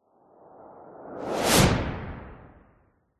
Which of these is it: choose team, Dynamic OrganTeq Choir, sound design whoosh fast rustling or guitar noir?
sound design whoosh fast rustling